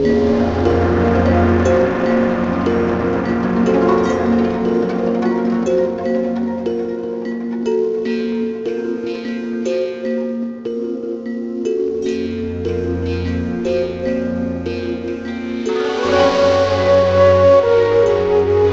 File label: MUSIC LEVEL